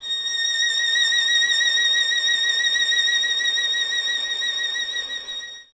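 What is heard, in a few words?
string violin, Solo Instruments; Miroslav Vitous - Solo Instruments VOL-2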